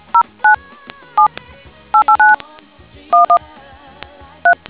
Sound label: dtmf